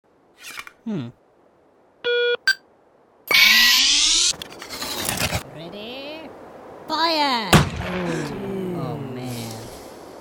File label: Teleporter; Escaping The Prison